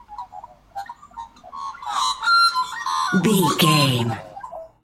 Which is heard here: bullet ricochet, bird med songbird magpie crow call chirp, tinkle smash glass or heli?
bird med songbird magpie crow call chirp